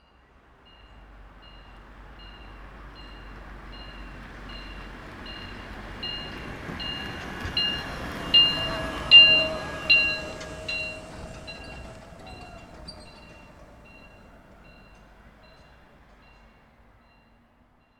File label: transport golf buggy pass bell buzzer ring warning maintenance vehicle; centre parcs